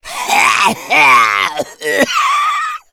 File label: smoker lurk, zombie; Left 4 Fortress